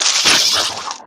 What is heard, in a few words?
Attack; Razorpetal Lasher